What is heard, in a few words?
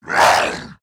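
attack, zombie male; nmr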